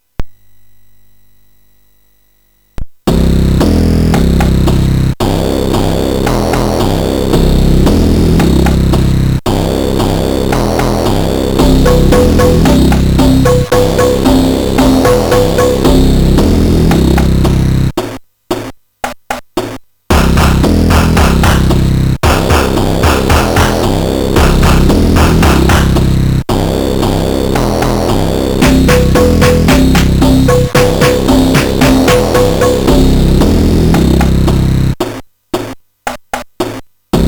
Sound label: Aztec Beat sid MOS6581R4